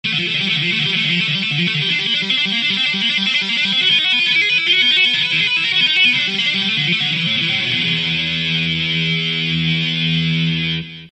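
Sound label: tapping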